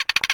Hawk; Toy Story Mania; iOS gamerip

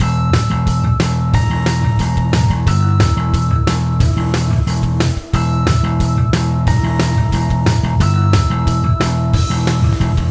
Battle Knife Fight; beyond reality gamerip

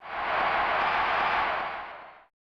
Goal Audience; stunt race fx

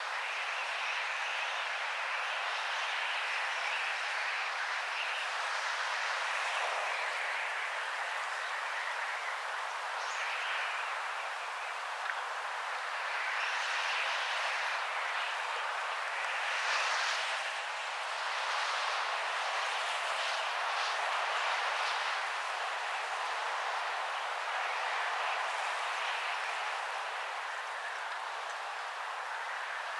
Heaven Light Amb; Funk of Titans; PS Vita

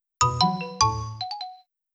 Jingle Good Response

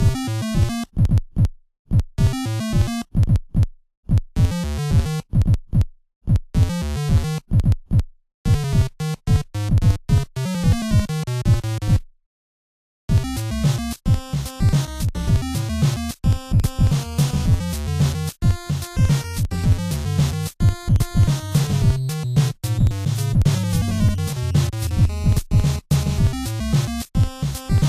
Instrumental; Bricks And Lifts; Friday Night Funkin' Super Mario Bros. Funk Mix OST PC gamerip

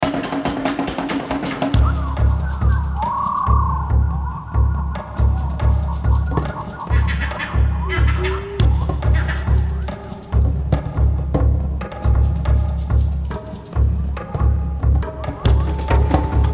Jungle Medium; Serious Sam 2; Mdigbo

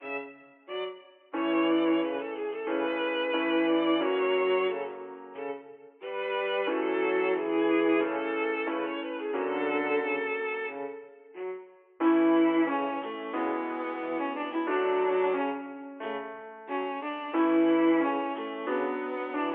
Classical BGM; FPS Chess; windows

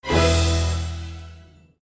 Card Rare Stinger; Zak Storm Super Pirate; mobile

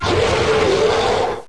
status boss Rage; SFX status boss Devastator Rage

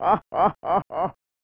"Hahahahaha"; Emerald Dragon; SNES

